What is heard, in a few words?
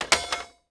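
pipe drop creaky wood; objects; Star Wars Obi-Wan; XBOX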